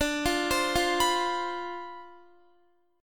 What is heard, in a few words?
D Minor 13th D, Guitar; Dm13 arpeggiated; JGuitar